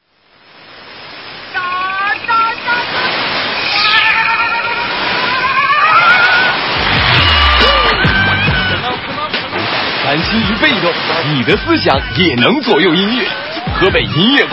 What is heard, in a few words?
Music Radio; Anhui